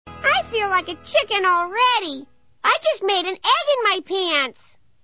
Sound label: fog